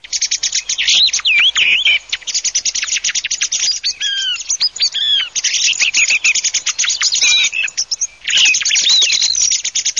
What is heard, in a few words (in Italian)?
TORDO